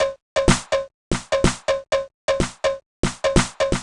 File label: perc go 125, Percussion Loops, Classic Chicago House